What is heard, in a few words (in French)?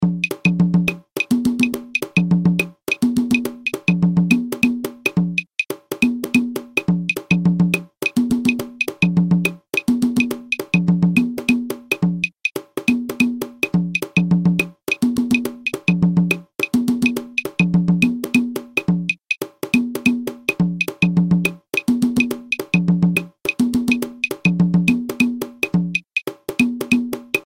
guarapachangueo cajon clave; clave 2 3